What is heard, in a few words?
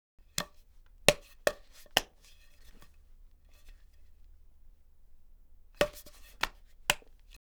Baseball Bat Movements; Fairlight Sound Lib